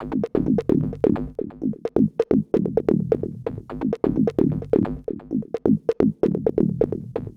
Bass Loop 130; Equipped Music; Loungin House

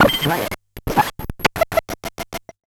Bent Talking Teacher, Circuit Bent

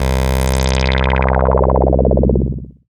SYN OBX-A, SYN Analog; Sound & Vision; Gigapack I CD 2

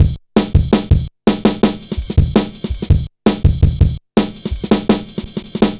heavy ridez, drum loops; Zero-G Jungle Warfare 1, 2 & 3

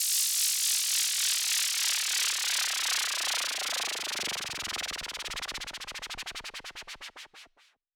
Downlifter; FX; 99Sounds; Monosounds

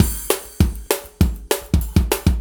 Warp Drive Beat, Processed Drumkits

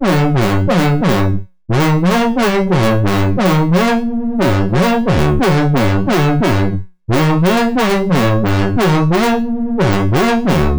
Konkrete Synth; Sonic Foundry (Sony Creative Software) - Club Joints and Street Anthems; Time Traveller

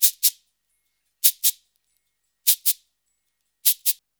Modern Percussion Loops; Soundscan vol.56; AKAI

